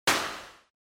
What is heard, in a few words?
Party Popper Dry